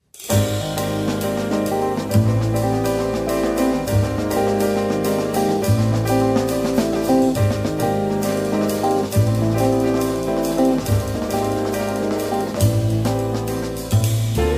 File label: swing; gusting